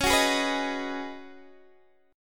Db 7th Suspended 2nd Suspended 4th, Guitar, DADGAD; x,16,16,13,14,13; Db7sus2sus4 strummed